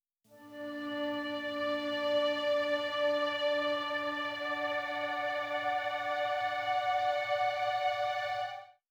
Music Peaceful Anxiety

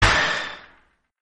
special sound; Caravan Beast

